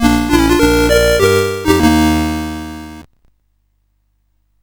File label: sid CSG8580R5; Rescue on Fractalus